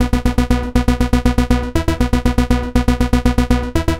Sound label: Progressive Synth, Trance